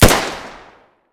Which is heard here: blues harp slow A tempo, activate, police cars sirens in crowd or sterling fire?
sterling fire